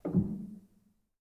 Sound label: rel, Grand